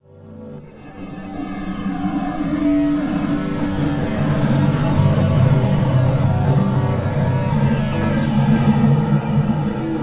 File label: Headswim, Harmonic Atmoshperic